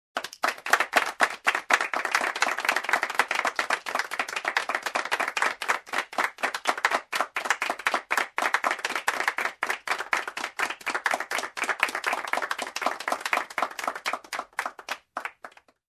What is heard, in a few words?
Extended Applause in a close room